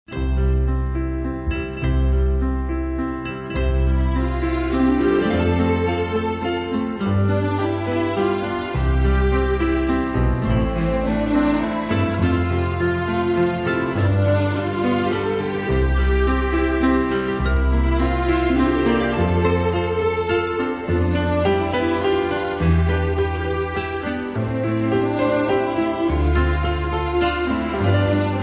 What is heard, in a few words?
Orchestra, Strings; Majesty Orchestra